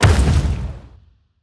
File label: lose